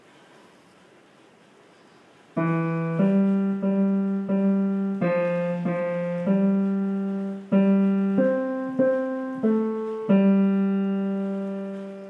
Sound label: columbine alma mater tenor